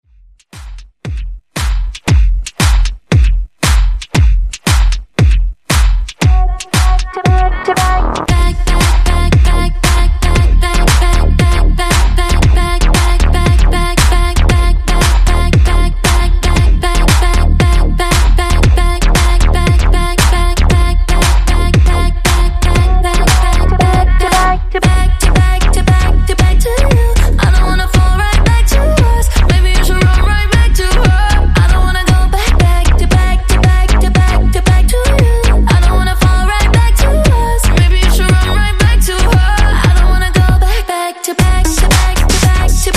116 BPM

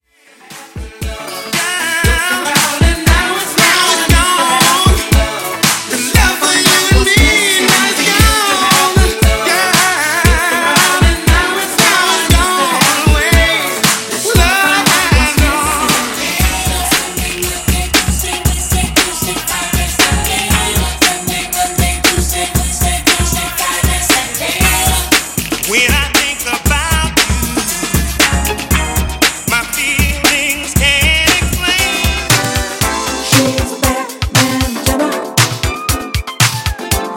80s Funk Music Megamix 117Bpm

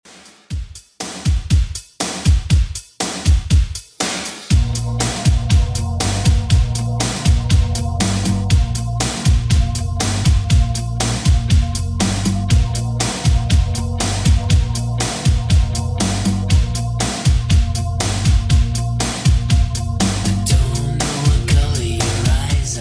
80s Rock Redrum 120bpm

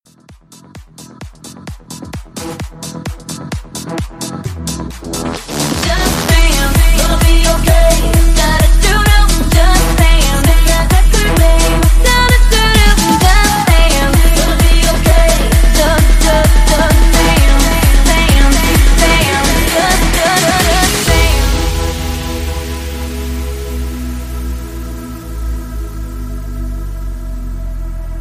House Mixshow Blend

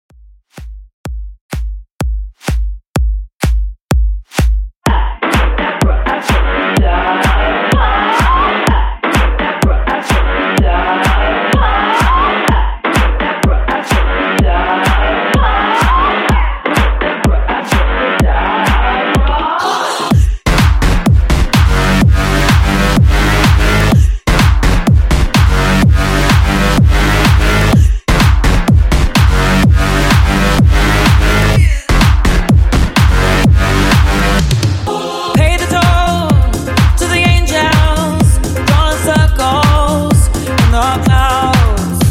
In Outro 126Bpm

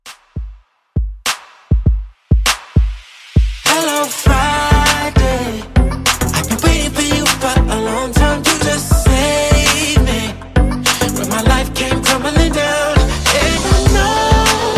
HipHop Re-Drum 100 BPM

